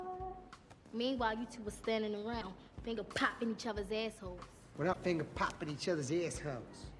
Finger Poppin

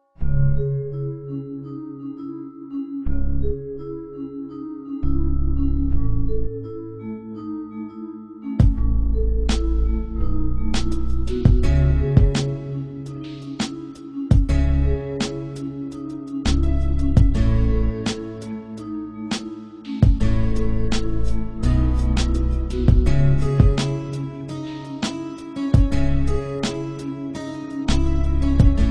Beat

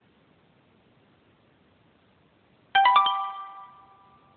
Notification Tone

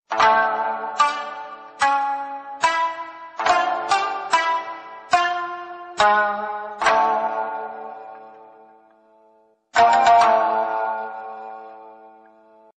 Shamisen

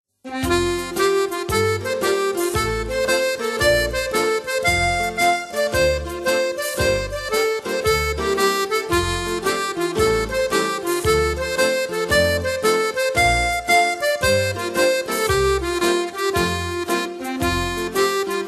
hornpipes

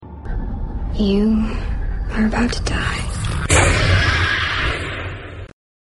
Scream Sms; Scream 4

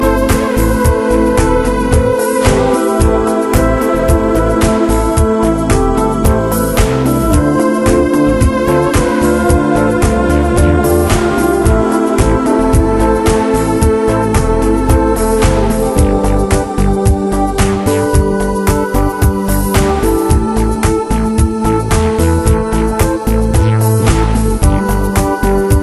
Non Vocal

